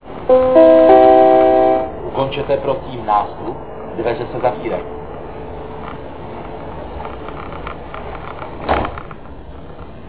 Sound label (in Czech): c odjezd, zvuky metro; Ukončete, prosím, nástup, dveře se zavírají. Příští stanice Kobylisy